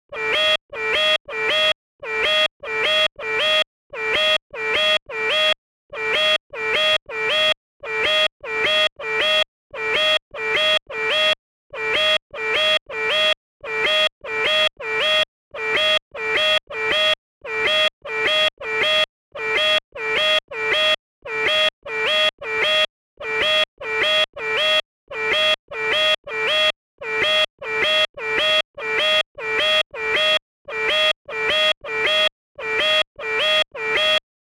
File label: SMOKE ALERT